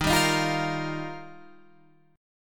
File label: D sharp Major Add 9th, Guitar, Standard; D#add9 strummed; 11,10,13,10,11,13; JGuitar